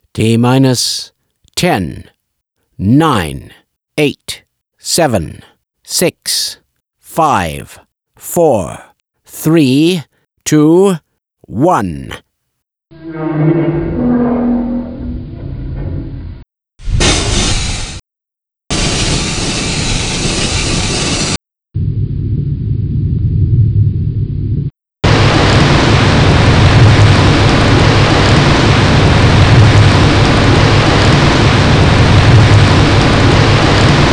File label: complete